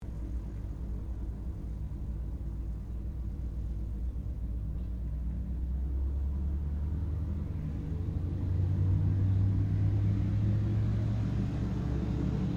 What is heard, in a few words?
AIRCRAFT HAWKER SEAFURY PREFLIGHT, fighter-bomber; Airplanes; Vehicles; Aircraft Collection; Twistedtracks